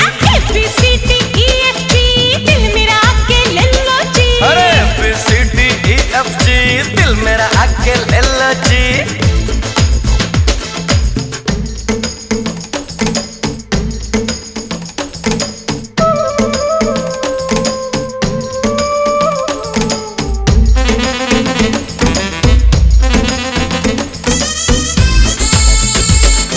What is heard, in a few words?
Ringtone A B C D E F G; ringtones